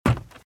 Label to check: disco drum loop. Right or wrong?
Wrong